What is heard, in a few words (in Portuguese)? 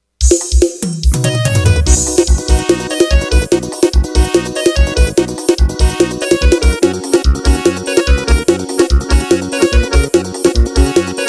forro